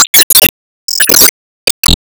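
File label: Sound Effect Enemy Appear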